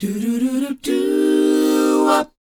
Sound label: DOWOP F, Vocal R&B DOO WOP; ILIO; Vocal Planet